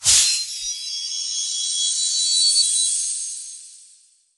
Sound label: Heal Cast